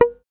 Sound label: GUI MUTE, E-Gui Muted